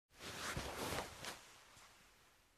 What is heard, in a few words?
Rubbing on soft cloth